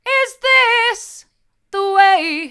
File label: hard, vocal